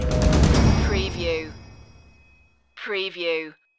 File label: its gonna be serious stinger